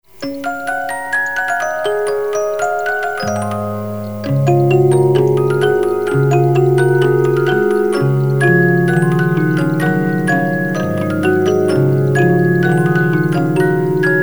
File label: 6th Symphony, 72 Note Movements